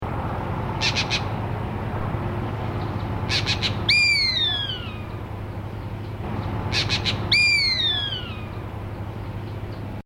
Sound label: gray hawk call